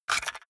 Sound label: cassette case take out hard; objekte; kassette